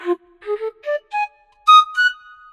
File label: piccolo sample